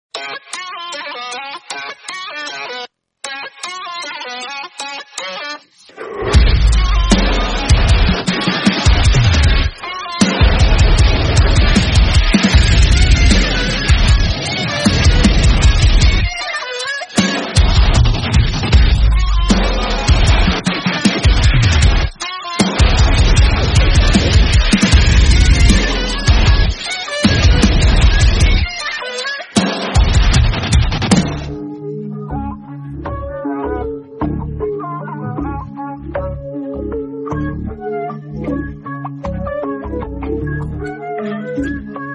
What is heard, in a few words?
karaoke; Insanely Illegal Cage Fight